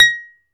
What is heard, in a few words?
BALALAIKA, Prima Balalaika, Plucked Strings